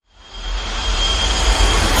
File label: Window Rattle